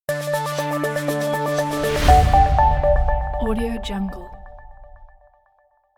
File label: modern synth logo